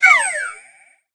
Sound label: death slide; smite; god cupid skin13